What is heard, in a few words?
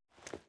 hitreact minor; HighGroundRoyaleNetcode